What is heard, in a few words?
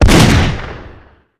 cmag fire, weapons; npc